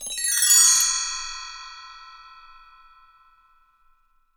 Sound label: Bells Chimes, PRC BTREE; NorthStar - Global Instruments VOL-2